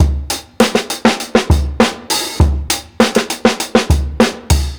60'S STYLE 100 BEAT, DRUMS LOOPS; BASEMENT DRUMS VOL 2

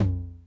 Tom Low Mid Tune Max Decay, 909

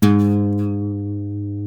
A 2C MARTIN 6, Guitars

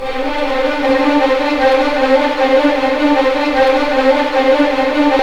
RIVER; East West; Scoring Tools; ORC; River Flow

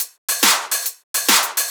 Shuffled Loops, 140 BPM; Vengeance - Essential Clubsounds Vol.4

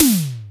JD800 Dance Card Drums

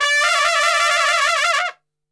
D 2X TRP SHK, Brass Super Section TRP SHAKE; Best Service

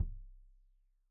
Kik Stereo; Deeply Sampled Drums

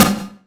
Snare; ModelCycles

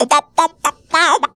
Funky Vocals; USB Soundscan vol.18; AKAI

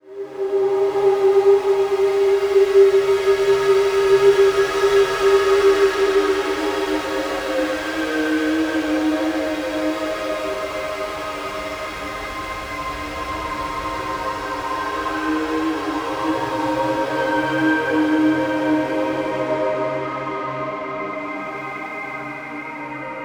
floating, Textures

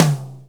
DRUMKIT T; Keith Emerson Signature Series

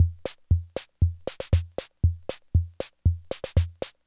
Loop, classic machines, rhythm77

